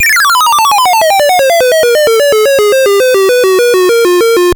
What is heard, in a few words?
Siren; Graz